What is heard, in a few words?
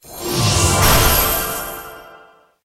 guild grade up effect